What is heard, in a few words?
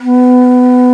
Tenor Bansuri B2; Multisamples; Digital Sound Factory Planet Earth